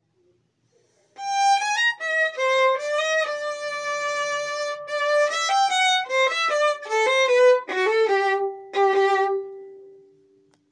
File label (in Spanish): Extracto C normal